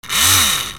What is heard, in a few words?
Mermaid Servo Short